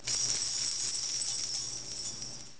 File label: sequin coin